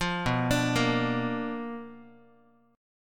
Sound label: B Minor Major 7th Flat 5th Guitar